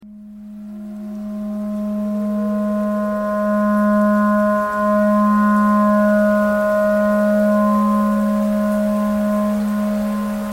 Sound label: tornado siren clarinet thread noise